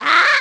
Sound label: Bokoblin Spot